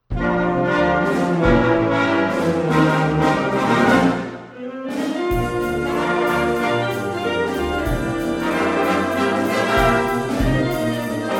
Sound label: old jazz history swing